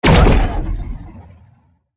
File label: shotgun; voidling